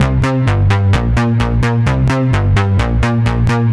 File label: kompressed fours C 129, synth loop; Zero-G; Soundclash; Nu Dance Electro